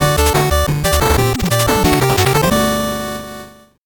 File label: 8bit jingle normal